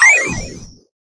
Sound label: defense